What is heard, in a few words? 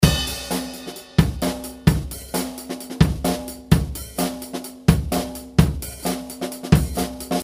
130bpm with ghostnotes